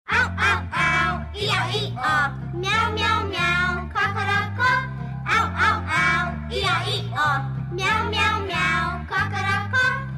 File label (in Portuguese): musica infantil animais